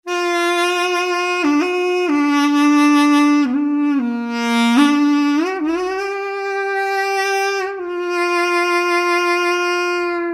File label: sax